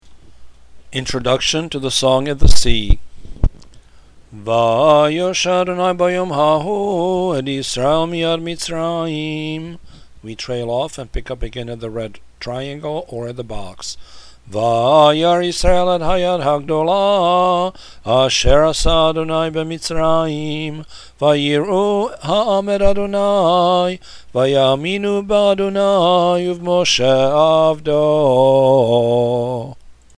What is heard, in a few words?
intro to song of the sea